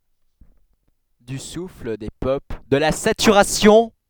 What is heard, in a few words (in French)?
souffle